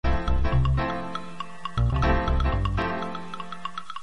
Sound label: melody